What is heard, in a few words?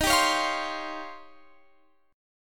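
E flat 13th E flat, down, Guitar, Open F; Eb13 strummed; JGuitar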